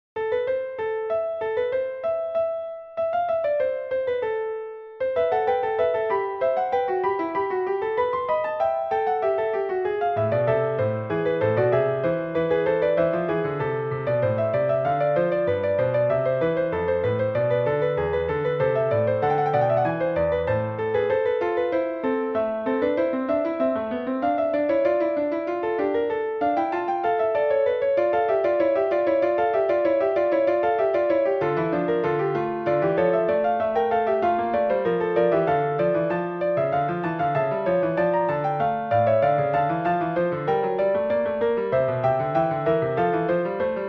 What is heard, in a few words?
Fugue, Baroque Style; Bach; JS Bach